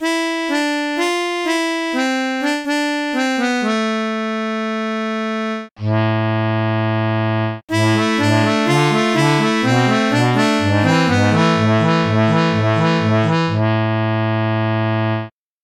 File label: harmonica